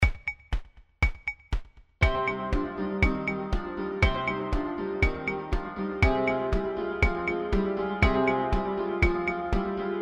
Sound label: Practising BACH